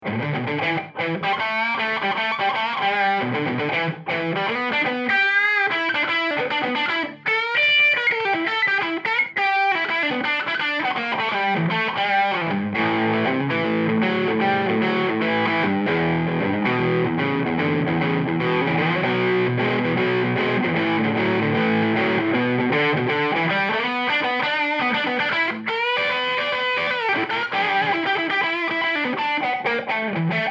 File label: Hyper Vintage Bridge Overdrive